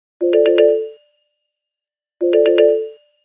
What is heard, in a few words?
Message